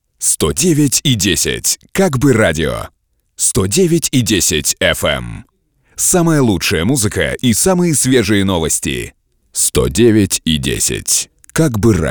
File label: Jingle